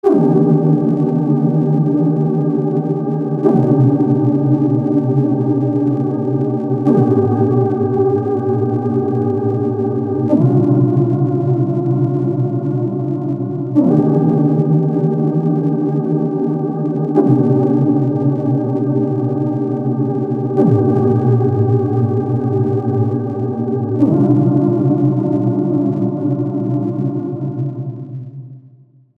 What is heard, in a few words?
neon sitar; cirrus